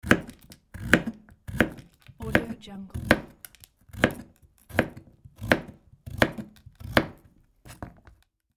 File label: chopping a carrot